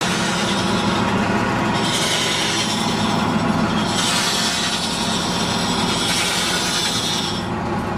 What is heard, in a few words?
Tatra